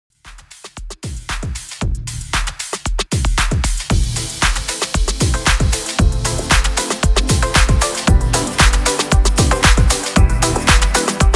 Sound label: House Mix 115 BPM